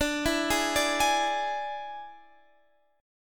D Suspended 2nd Flat 5th D Guitar Standard; 22,19,18,19,21,x; arpeggiated

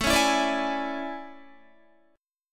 A Major 11th, down Guitar; AM11; strummed; JGuitar

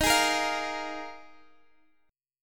Eb Suspended 2nd Suspended 4th, Guitar Open F; strummed; x,x,15,15,13,12

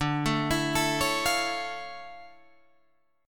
D Minor Major 9th D down Guitar Open D

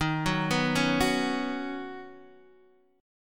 D sharp Minor 7th Sharp 5th, Guitar, DADGAD

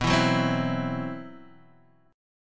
C Major 7th Flat 5th B

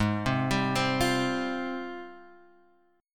G sharp Major 13th, Guitar, Drop D; 6,3,5,5,6,x

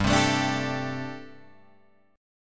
G Suspended 2nd Suspended 4th G, Guitar, Open G

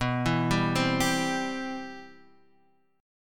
G 6th Add 11th B, chord; G6add11/B Chord {X 2 2 0 1 3} arpeggiated; 6add11:1,3,(5),6,11

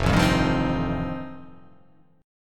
F 9th F, chord

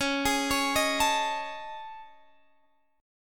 A Major Sharp 11th, Db

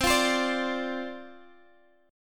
D-Minor 7th C, chord

